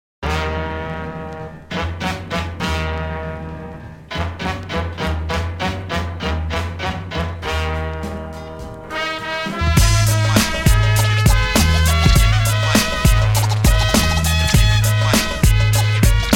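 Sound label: dance music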